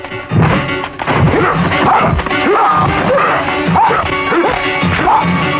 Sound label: fight scene, Kung Fu Construction Kit